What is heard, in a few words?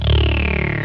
sawtoothy, Synths; Notes